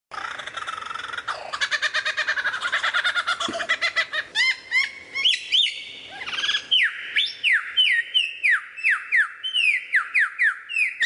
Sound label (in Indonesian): suara lyrebird